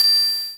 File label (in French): Tintement